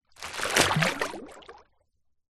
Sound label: foley plastic bag empty submerge in deep water bubbles; zapsplat